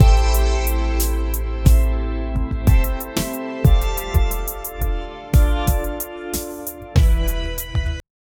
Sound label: Soulful Calm Hip Hop; stable audio open small; Stability AI releases a compact open text-to-audio model that runs on mobile devices